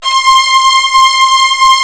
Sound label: strings